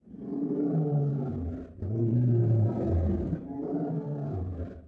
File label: growl; Tribes2